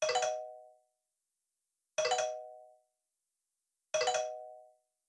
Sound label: Ring; blue archive